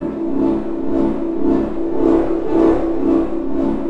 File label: TUBE SPUN